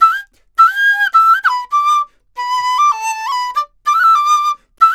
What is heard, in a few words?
DIZU, 100; INIS - Opium; DIZU 100-G